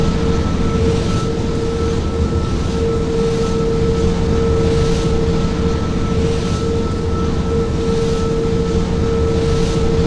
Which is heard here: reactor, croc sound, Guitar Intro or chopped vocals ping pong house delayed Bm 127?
reactor